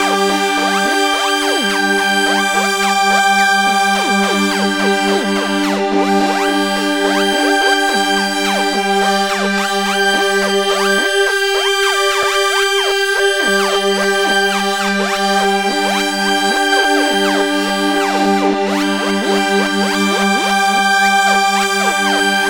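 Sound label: sequence JP8; AMG; Now CD-ROM (Roland); Trance; G.T.M. SEQ 2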